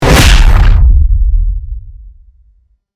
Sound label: heavy punch